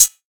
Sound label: thin pedal hi hat g sharp key, pedal hi-hat single hit tuned to the G# note